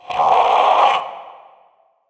Possessed Red Veil Vocal Death; Warframe; PriestQuest

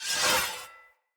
Melee Whoosh Accent; Weapons; Swords; Dendrit Short Sword; Vetch

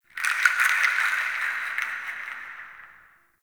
Wood Chimes; Ambience; Warframe; Nokko Colony

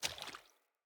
Sandals Walk Water; Player; Footsteps

